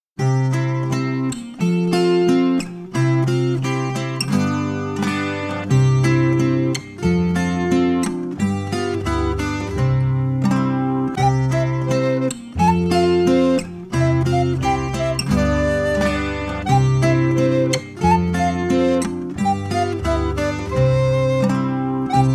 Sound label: instrumental